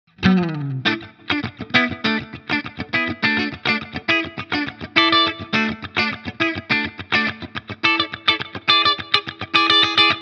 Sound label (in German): Mitte clean